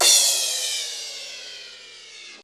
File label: EFF CYM; Crypton; DrumBase5000